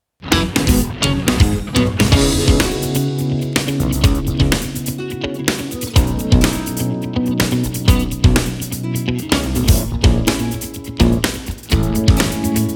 Funky

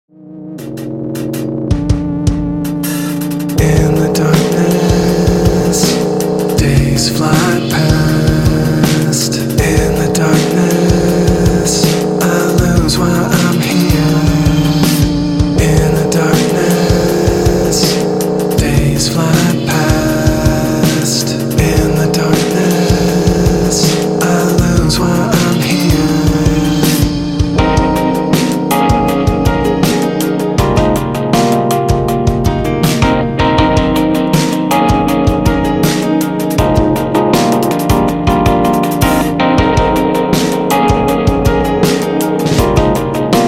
Electronic Neo Soul

